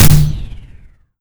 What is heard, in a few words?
bow shoot